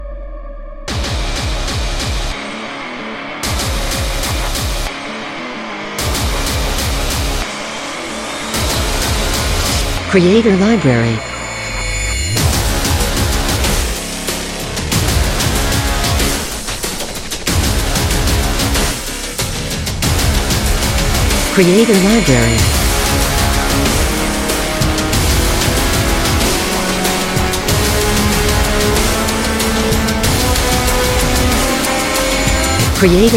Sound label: Trailer Aggressor, cinematic music